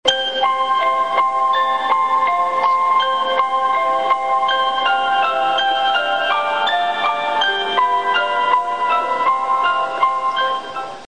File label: bus chime; 富士急バス; チャイム１は高速バス